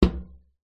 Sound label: impacts metal knock hit hand basin